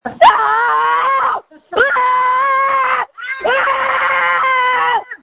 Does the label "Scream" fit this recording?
Yes